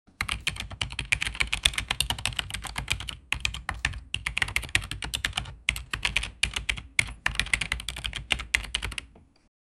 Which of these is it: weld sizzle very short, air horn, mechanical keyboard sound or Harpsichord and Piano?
mechanical keyboard sound